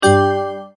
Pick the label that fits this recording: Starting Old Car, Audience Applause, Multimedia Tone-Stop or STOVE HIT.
Multimedia Tone-Stop